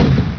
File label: grenade; diskmag; hotmag